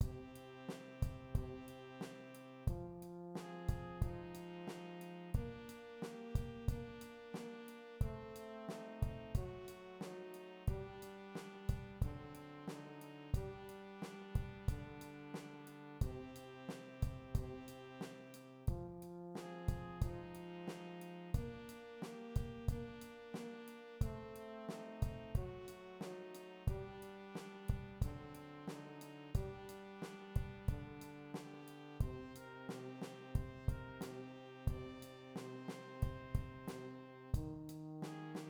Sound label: perc